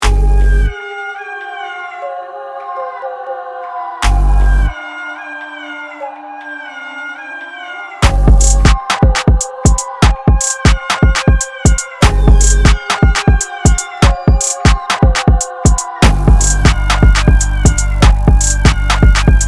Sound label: drums too loud; weeklybeats 2026